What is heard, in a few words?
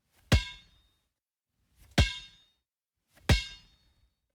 basketball dribble pavement